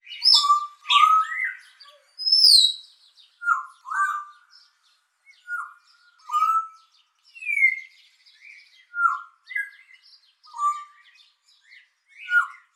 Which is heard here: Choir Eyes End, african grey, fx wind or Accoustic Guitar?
african grey